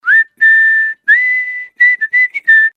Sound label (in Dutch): Fluitje; Wehkamp